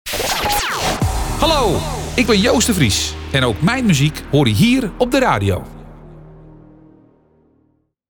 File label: Jingle